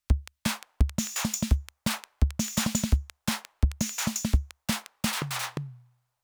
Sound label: Beat